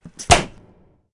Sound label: balloon pop